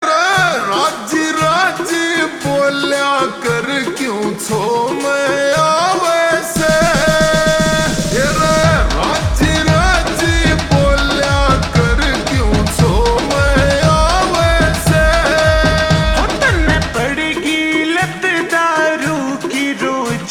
Ringtone Slowed Reverb

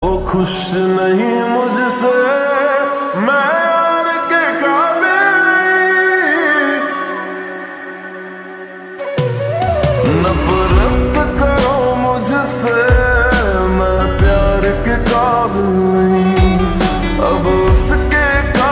Ringtone Slowed Reverb

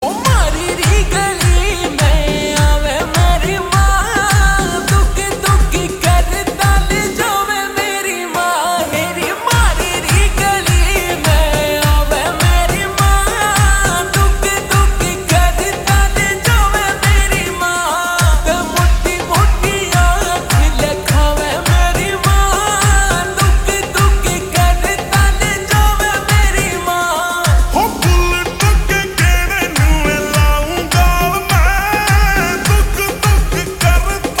Ringtone Slowed Reverb

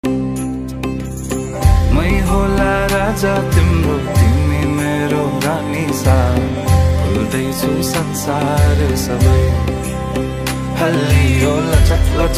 Ringtone

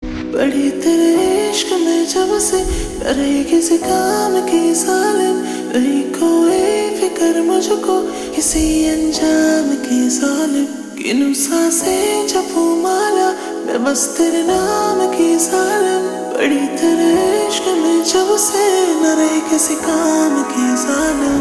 Ringtone Slowed Reverb

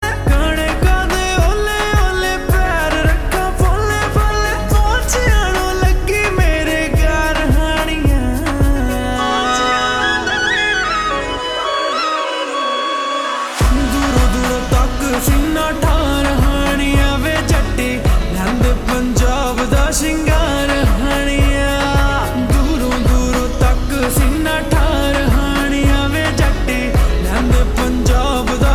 Ringtone Slowed Reverb

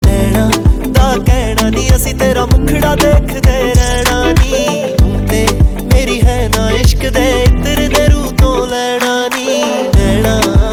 Ringtone

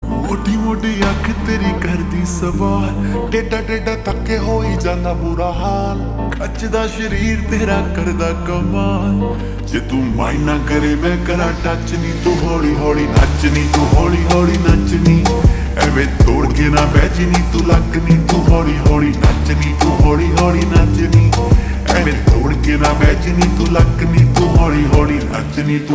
Ringtone Slowed Reverb

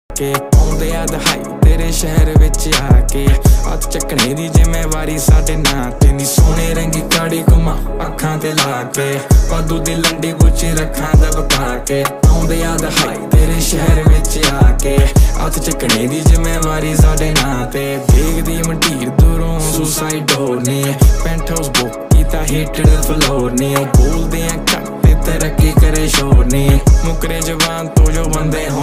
Ringtone

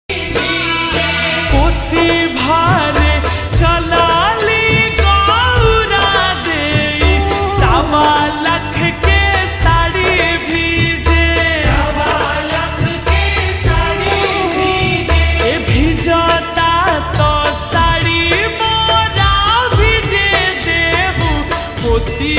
Slowed Reverb

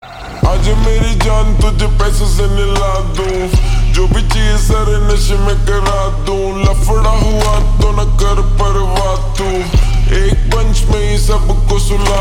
Ringtone Slowed Reverb

